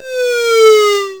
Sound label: Platform Fall; mm7sfx